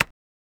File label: a simple single keyboard, keyboard